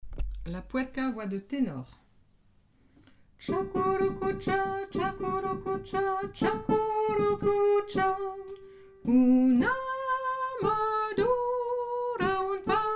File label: tenor